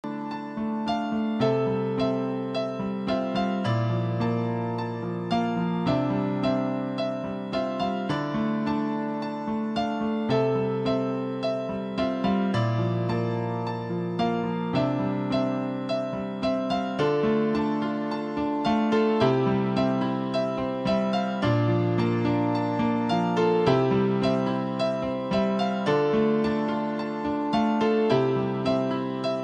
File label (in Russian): pianino